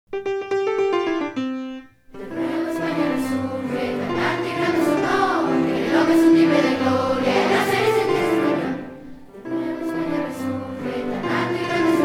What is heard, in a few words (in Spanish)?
Himno Republicano Español